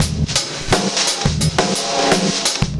Sunday Shuffle Loop, Drum Loops Compressed; Zero-G Smoke

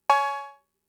Cowbell; Fricke MFB-522

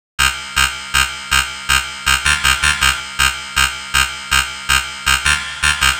Dance Synths, Hardcore Loops, Synth Loops

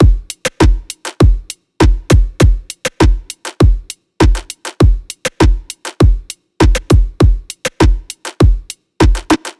EDM Drum Loop 100 BPM; 99Sounds x Monosounds; Dance

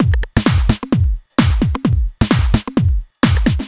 130 Hard Mean, Drum Loops 130bpm; Zero-G; Soundclash Nu Dance Electro; Acidized Wavs

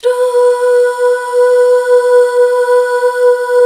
Duh Fem; BACK. DUUHH; HAL

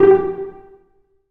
Cel Pz G1; Digital Sound Factory Virtuoso

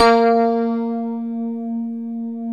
F2, PNO; Northstar - New Gold VOL-1; PLATINUM PNO

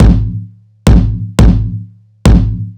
Kick, Kick Loops

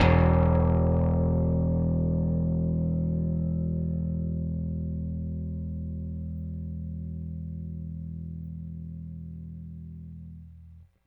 Rickenbacker Bass; Airwindows

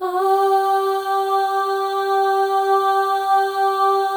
AHS, FEM; Spectrasonics; FEM.2 AHS

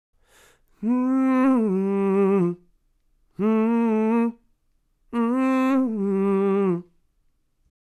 Soul Vocals Gm 140 BPM, Melodies; 99Sounds x Monosounds

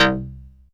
MTL, SYNTH BASS; East Collexion; Bass S3000

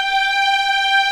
G5 LEG MFI R, String; East West; The Ultimate String Collection

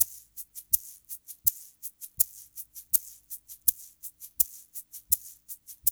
SHAKER, Funky Ass Loops; East West

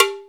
bell; E-MU Formula 4000 Series Vol. 1; Hip Hop Nation; Bonus Perk

